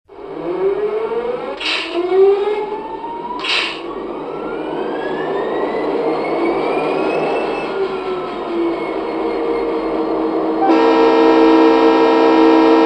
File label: FP45